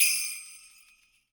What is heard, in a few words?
Sleigh Bells, Latin Percussion, One Shots; Sonic Foundry (Sony Creative Software); Joe Vitale Latin Percussion